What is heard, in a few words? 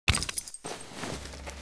grab; Mafie 1